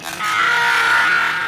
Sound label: weird death sound; battlefield 3